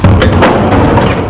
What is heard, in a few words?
door open